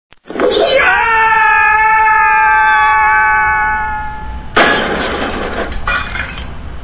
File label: falling